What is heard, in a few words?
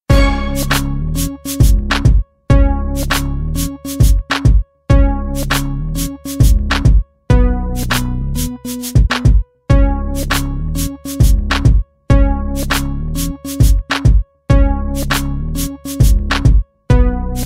karaoke instrumental